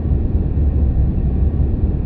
corridor amb; trek

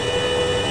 machine

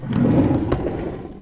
drawer open

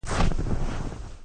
snow, footstep

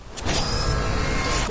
door close; doors

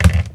clunk

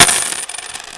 coin

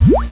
bubble

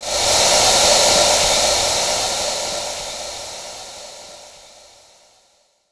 gas release

